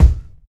kick one shot C key

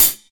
short pedal hi hat g sharp; g sharp key

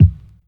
kick sound f sharp key

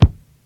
vintage bass drum sound g key

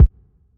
large room bass drum sound b key

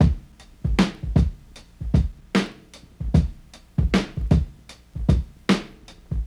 77 bpm drum groove c sharp key

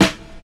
raw snare drum f sharp key

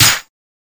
long room reverb snare sample c sharp key

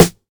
prominent acoustic snare sound e key; snare drum samples

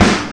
boom snare g key

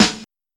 00s reverb steel snare drum a key; snare-drum-samples

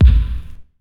ambient kickdrum sample g sharp key

